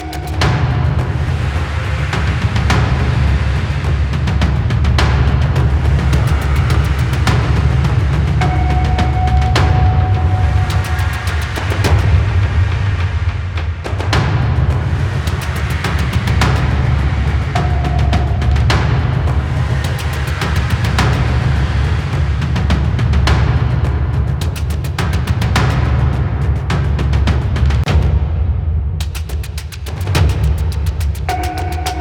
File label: Void Tunnel Travel Music